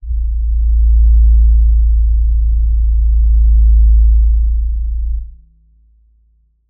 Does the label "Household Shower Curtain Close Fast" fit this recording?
No